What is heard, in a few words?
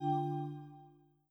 Windows Critical Stop; GMM Windows 10